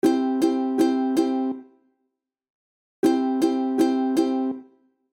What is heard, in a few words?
ukulele strumming dudu; ukulele schlagmuster anfaenger lernen